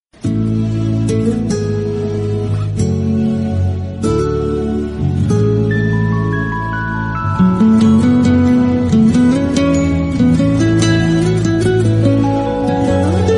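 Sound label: Romantic Love background music, Telugu Ringtone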